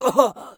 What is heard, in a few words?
hit; Escape from Tarkov; scav2